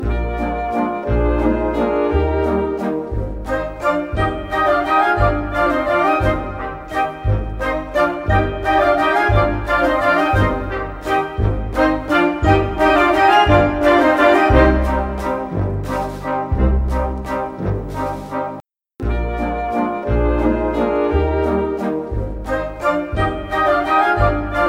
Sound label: waltz no 2 part D